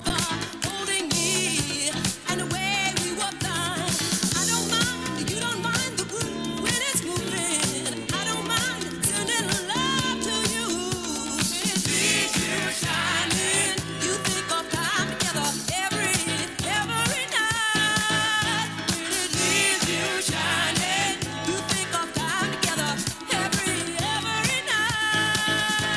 VOCAL

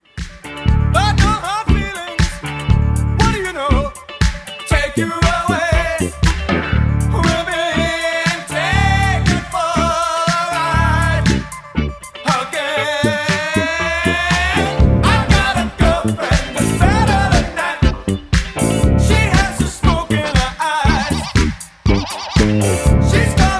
VOCAL

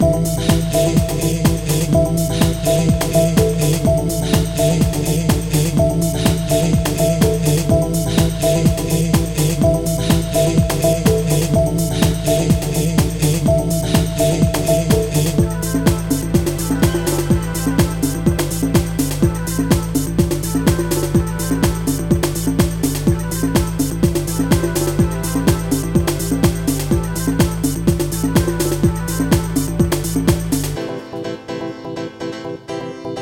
Tropical Caribe Version; house

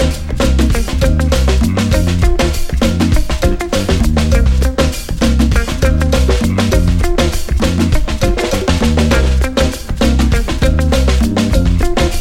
Instrumental Version

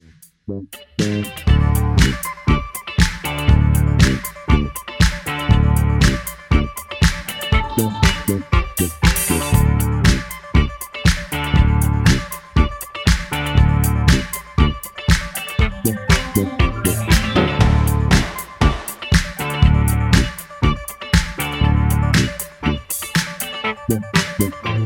INSTRUMENTAL

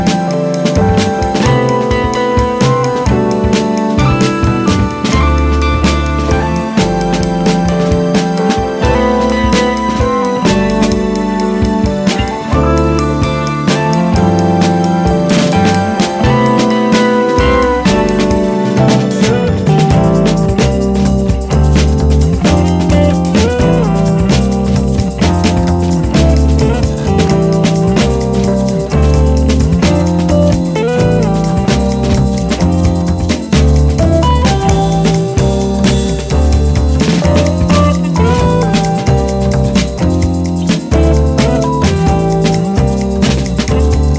Guitar

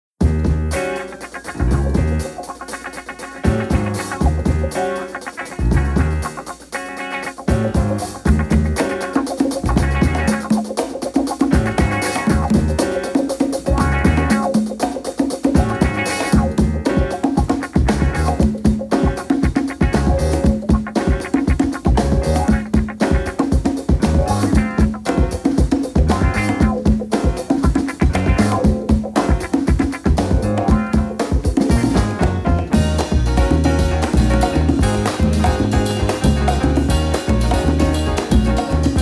Instrumental

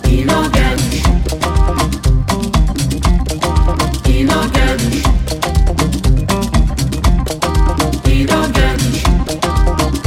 Instrumental Version With Backing Vocals

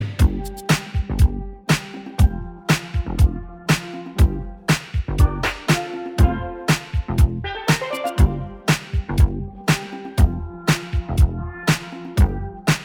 instrumental mix

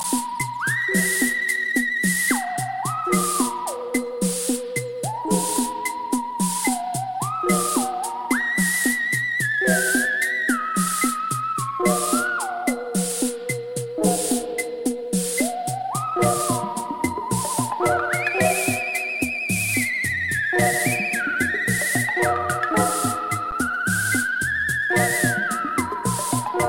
ARPS; house

